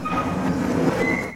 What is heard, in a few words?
metal move, door rotating; hl2; doors; func door rotating